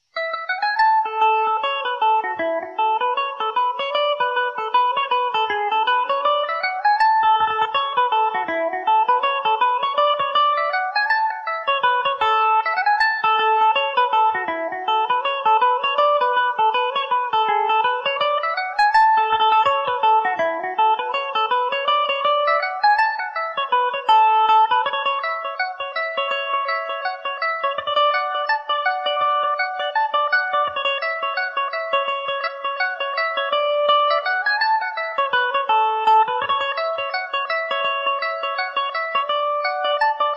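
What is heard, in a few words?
A major, Irish mandolin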